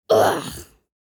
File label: Little boy clearing throat